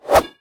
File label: fire, combat, weapons, swingable; default swingable